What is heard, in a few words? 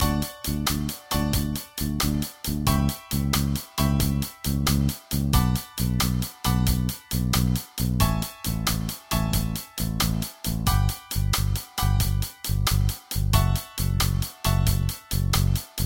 chords